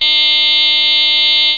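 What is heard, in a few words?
SMOKALRM smoke alarm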